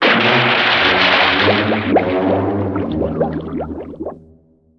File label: drunken haze target; weapons; dota; brewmaster